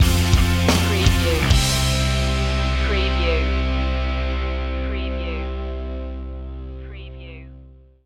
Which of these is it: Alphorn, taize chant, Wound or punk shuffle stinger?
punk shuffle stinger